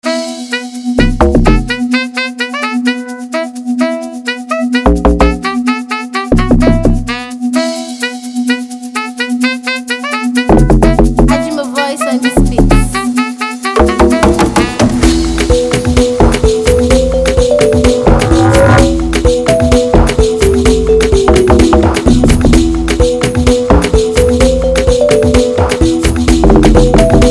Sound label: Christmas Dance Beat Amapiano